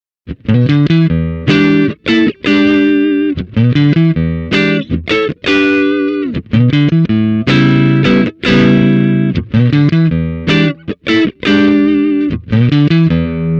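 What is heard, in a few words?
Squier Czysta G, classic strat humbucker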